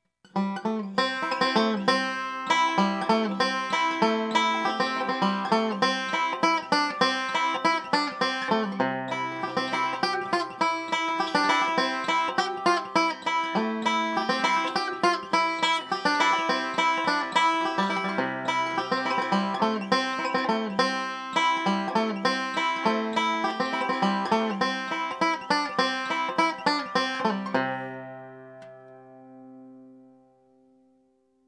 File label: BANJO